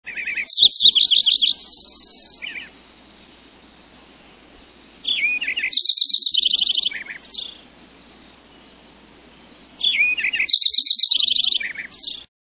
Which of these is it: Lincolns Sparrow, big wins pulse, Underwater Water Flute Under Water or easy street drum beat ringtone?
Lincolns Sparrow